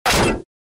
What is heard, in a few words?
Headshot sound; Csgo